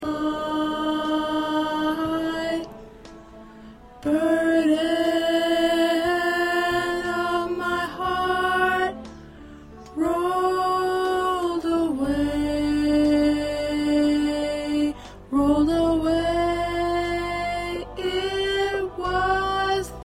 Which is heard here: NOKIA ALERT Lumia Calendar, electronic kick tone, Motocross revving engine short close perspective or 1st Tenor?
1st Tenor